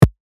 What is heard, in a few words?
landed